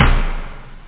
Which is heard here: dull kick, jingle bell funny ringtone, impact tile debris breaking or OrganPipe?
dull kick